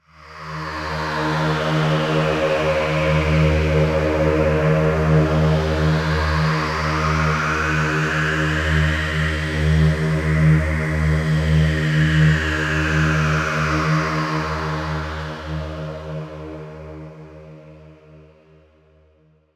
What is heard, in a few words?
Atmosphere D# 142 BPM, Psytrance; Fallon